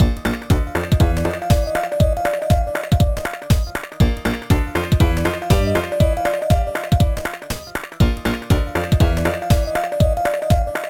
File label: Coaster Enter Calibrate, Coaster music